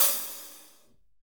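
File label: HH, hi-hat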